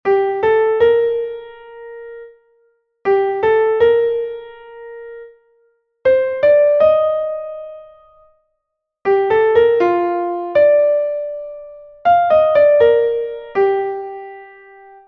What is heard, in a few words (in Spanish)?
Melodía